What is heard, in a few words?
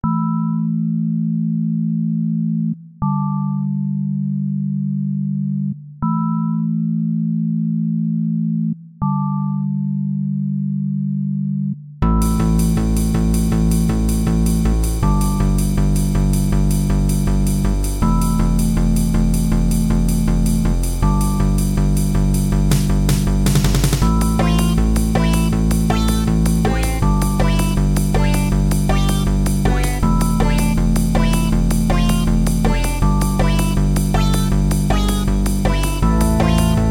noisy bass